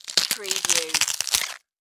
Carcass Flesh Rip

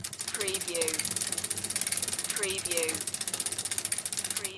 Bike Chain Spin Moderate

